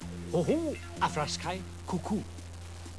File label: hoho